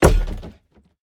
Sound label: clap; argus; smite; hera